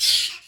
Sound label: Damage; Qurian; Mob